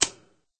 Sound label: switch click hard